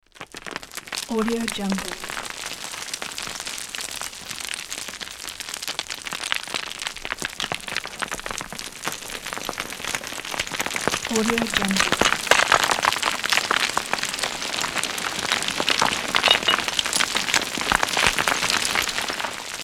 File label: small rock landslide; hollywoodedge